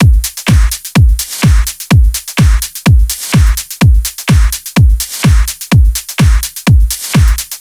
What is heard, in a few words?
126BPM Kit; Vengeance Samples; VFH2 126BPM Secrets Kit